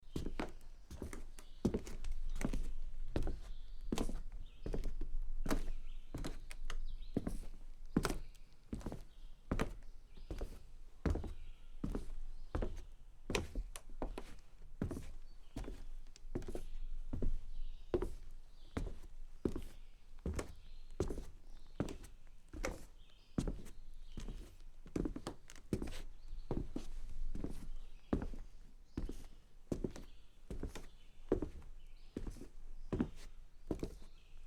soft soles on wood porch

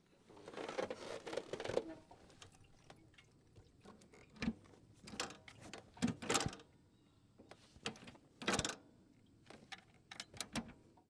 metal cleaning

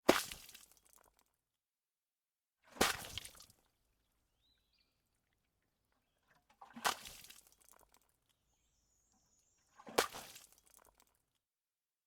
water splash on cement series

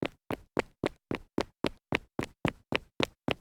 dress shoe run on concrete

